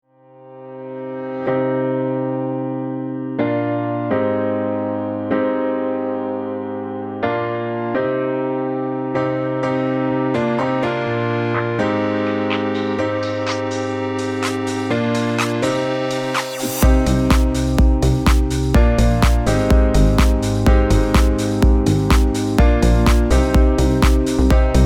Rock Instrumental

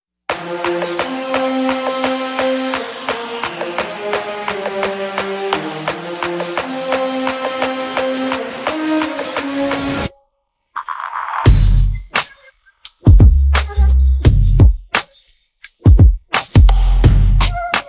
Instrumental

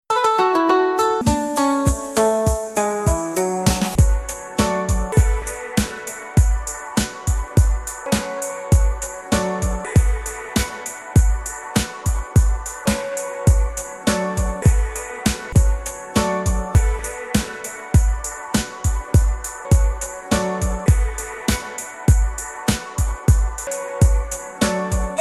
no copyright music

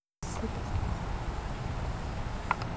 Artifact Mhz

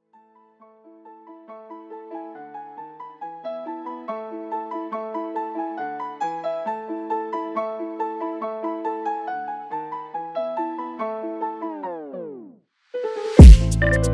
Mozart drill remix

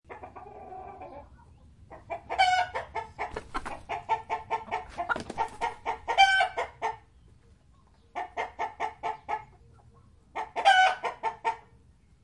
chickens clucking and rooster with cockadoodledoo

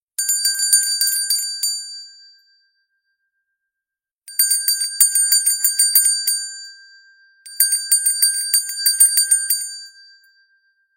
Hand bell ringing sound effect stereo; HQ 96kHz

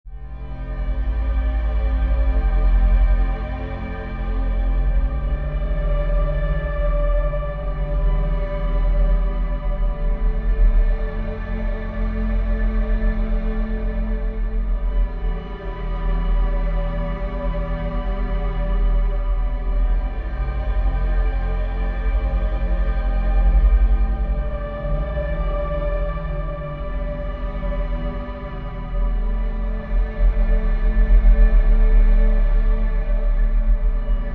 ambient

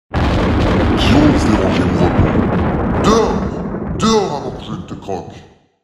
Dragon

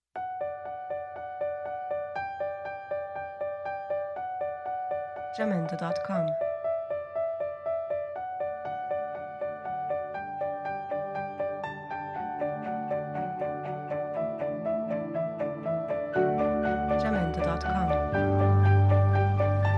Motivational Piano

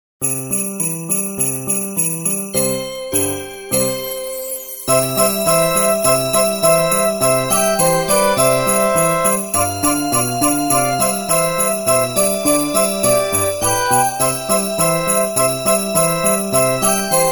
Jingle Bells Vive le vent Singing Bell

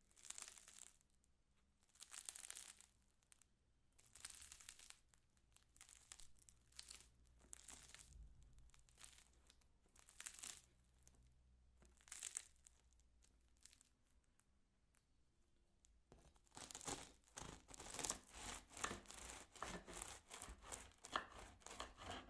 CUTTING BREAD SOUND EFFECTS

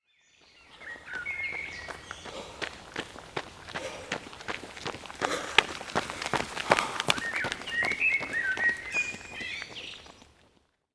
man running in the forest

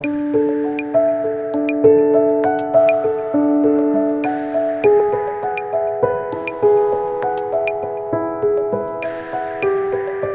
spring flower; melodyloops

